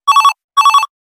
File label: Classic Double, Business Rings